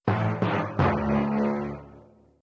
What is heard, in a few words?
dun dun dun; Delsym; Unity; LearnWithTouch